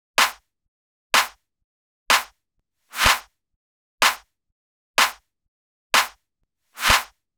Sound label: Slap House 125bpm Clap; EDM Drums; Drum Kits; Stems; Ghosthack; Ultimate EDM Drums; Slap House Kit 14